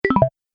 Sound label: Game Put It Down; video game; Video Game Sound Effects